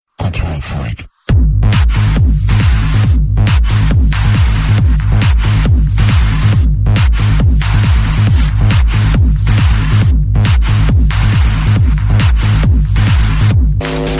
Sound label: Club Bass